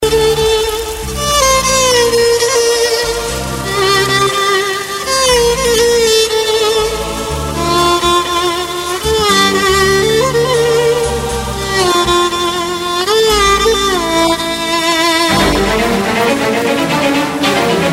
Superb Flute

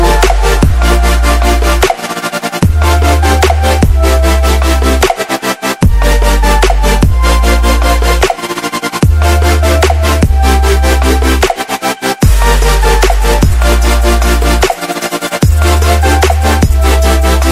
bass Drop

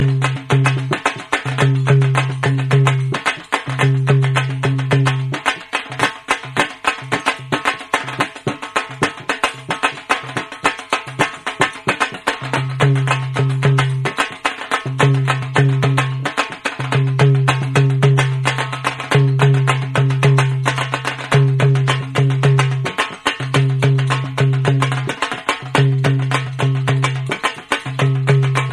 Kashmiri Dhol

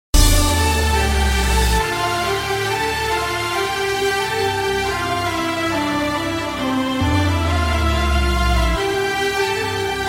Beat

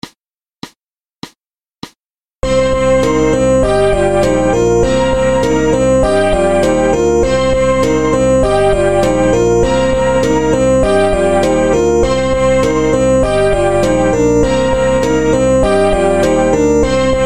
Big Bass

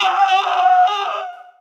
Scream; Mario Maker

